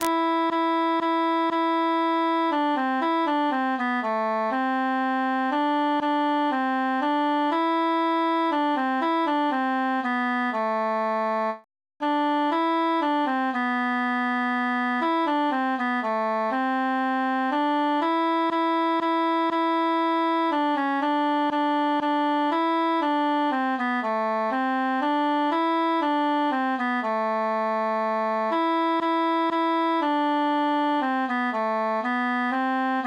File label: altus solo; son me regarde prennes i garde he mi enfant